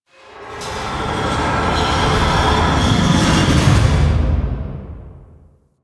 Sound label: Town Large Moving Object, Ambience; Warframe; Duviri